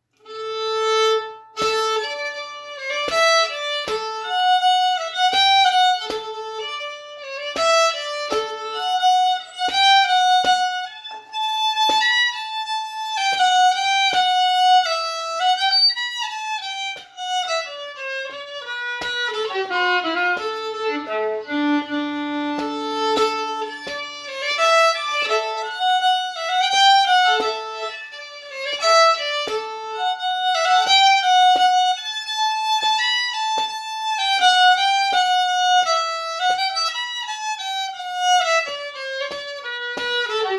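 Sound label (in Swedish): polska lugnt tempo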